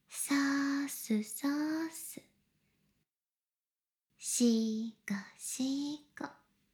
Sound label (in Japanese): しこしこ音声; 少尉; 牧場戦腺